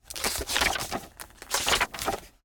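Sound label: Paper Handle Large Book Squeak Page Turn; Mechanical Wave; Cardboard and Paper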